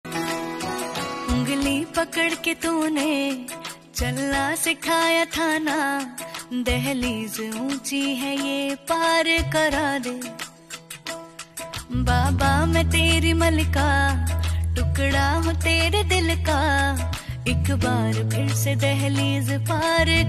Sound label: Tune Ringtone